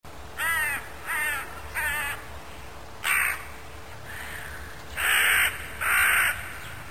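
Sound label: hooded crow voice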